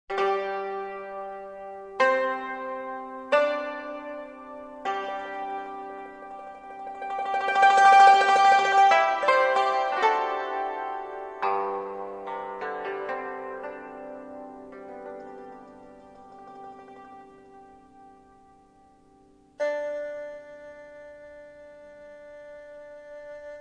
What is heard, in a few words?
string